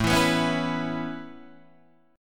A Major Add 9th A Guitar Open D, chord

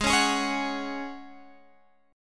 G sharp 7th Suspended 4th; strummed; x,11,11,13,14,14; G#7sus4

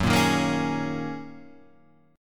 F sharp 6th Add 9th F sharp Guitar Half Step Down

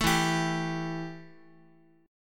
E Minor Double Flat 5th E, Guitar; strummed; JGuitar

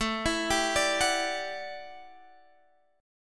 E Minor 9th A; Em9/A arpeggiated

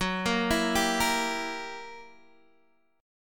Fsharp 7th Flat 9th down Guitar DADGAD

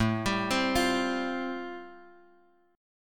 A-Minor 7th Sharp 5th, Bass Standard, chord; Am7#5 arpeggiated; JGuitar

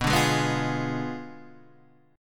B Diminished 7th B down Guitar Standard

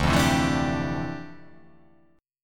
D Minor 11th D down, guitar

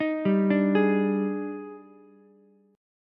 Eb Major 11th, Ukulele, C6; EbM11; arpeggiated; JGuitar

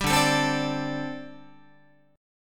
D 7th Suspended 4th, down, Guitar; D7sus4; strummed; 10,10,12,10,13,13

